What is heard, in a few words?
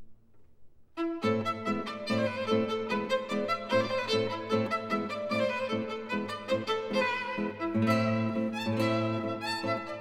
violino e chitarra, A minor; Allegretto; Sei sonate M.S. 27 (op.3) per violino e chitarra; Sonata n.4